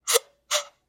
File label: Bycicle Horn; SoundsCrate